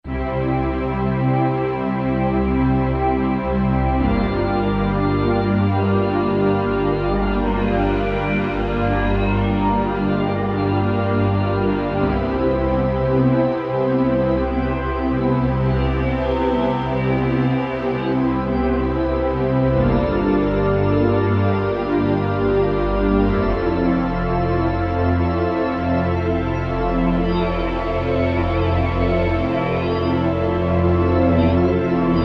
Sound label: Mph, E-mu Morpheus; Factory Presets